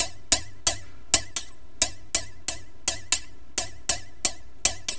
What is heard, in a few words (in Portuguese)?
Beat Ferrinho